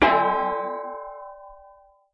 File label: gongi; Clangy Crunchy Foundsound Kit